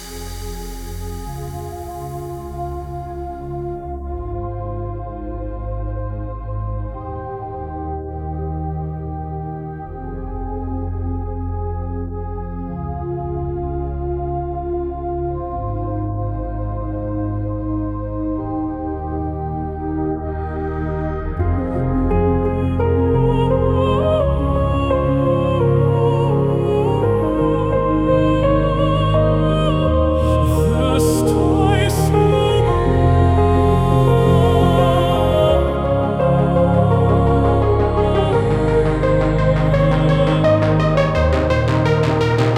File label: CHORDS